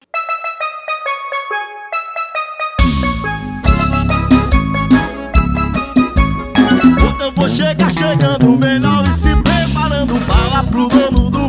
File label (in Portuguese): forró